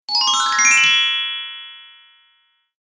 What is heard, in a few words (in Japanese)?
グロッケン グリッサンド